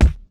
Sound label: kick, Roland MC-909